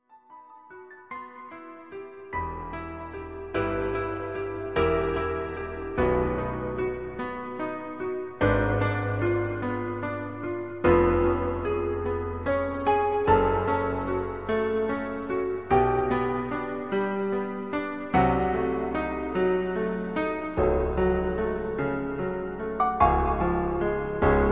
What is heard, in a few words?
Piano